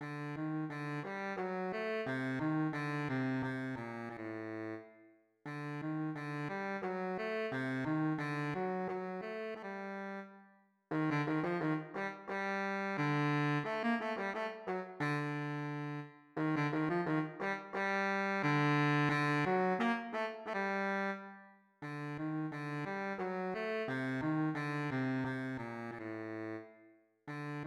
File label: Bari Sax